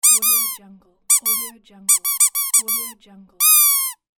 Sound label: squeaky toy